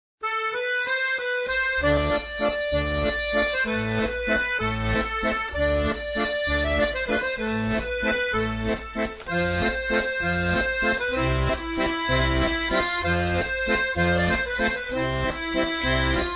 muzika

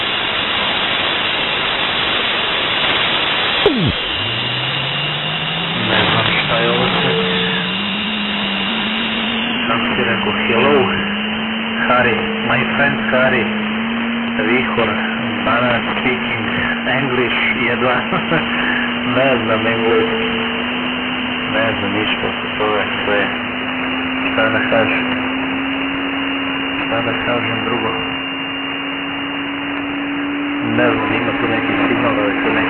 Vihor 1695kHz

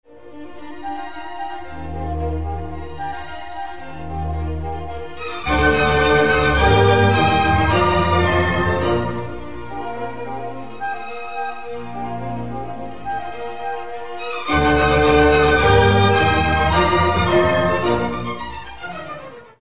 VELIKA DINAMIKA

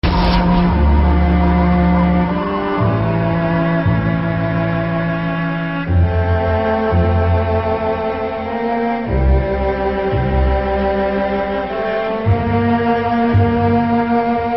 nepoznata muzika

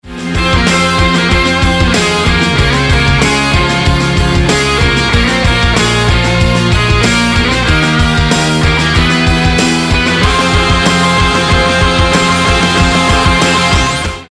mala dinamika

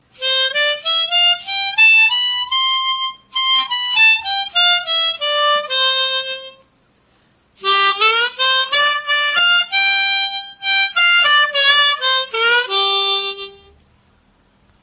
skala C

